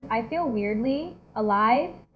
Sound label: beat